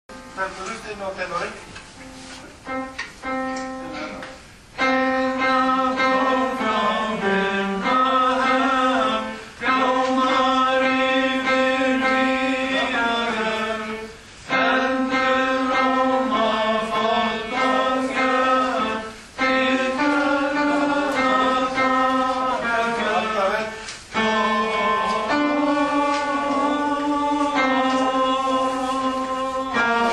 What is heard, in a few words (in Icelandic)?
tenor